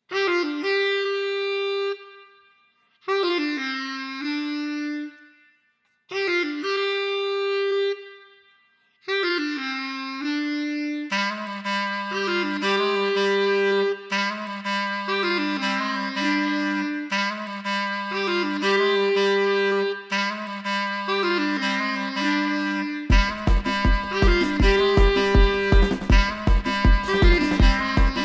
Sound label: drum n breath